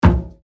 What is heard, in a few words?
Footsteps on metal ship deck single step